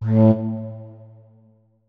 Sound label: flute notes; Hideout Furniture